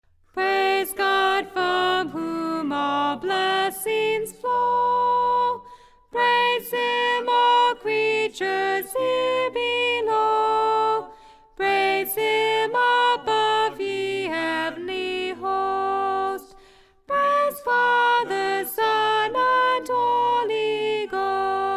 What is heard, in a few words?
Doxology Soprano; audio files for the different parts of the Doxology to be prac; Gospel Church